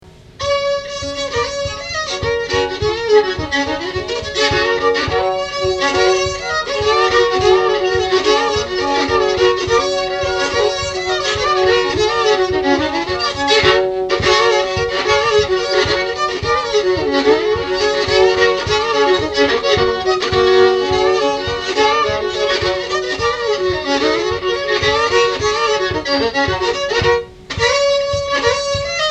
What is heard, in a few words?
Cotillion duet